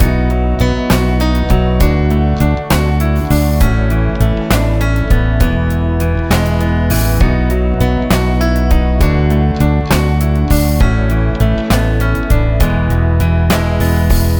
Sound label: Verse Loop